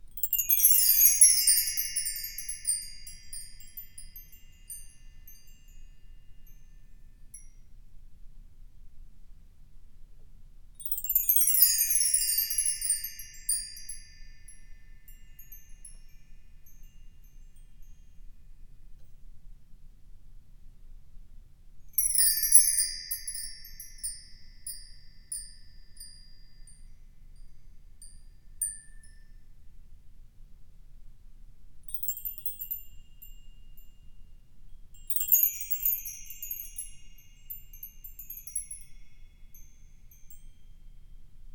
BELL TREE UP DOWN ERIE STYLISTIC VARIOUS SPEED LENGTH; The Chris Alan; Musical Oddities; Bells, Whistles, Horns, Percussion and More; GDC 2018; Game Audio Bundle